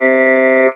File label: Talk Box "ee"